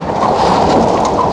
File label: Car Skid gravel